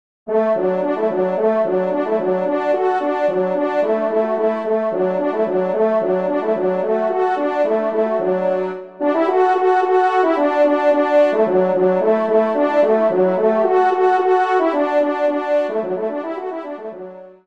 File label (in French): TRP